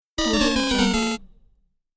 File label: game over arcade